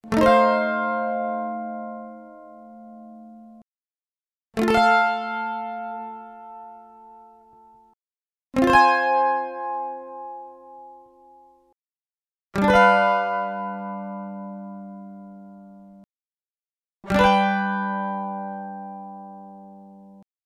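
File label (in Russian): звуки мандолина mandolin chord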